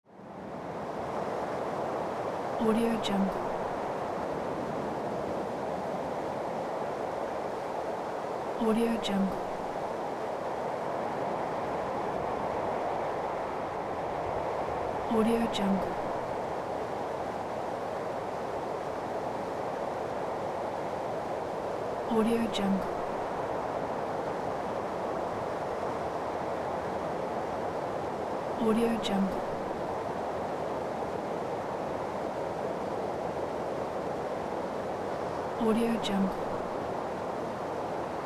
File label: wind; dauzkobza